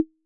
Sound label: Electronic Claves